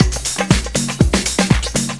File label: afrohead, Deep House 120bpm